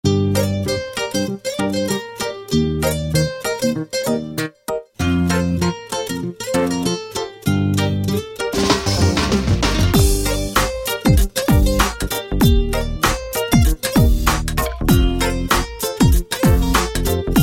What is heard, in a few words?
Cute Music